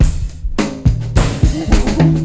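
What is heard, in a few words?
drum, breakbeat 106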